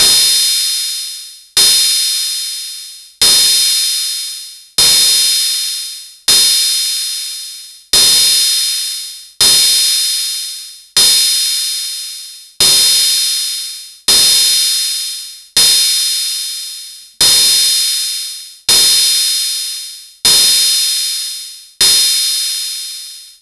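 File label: open hat, saturated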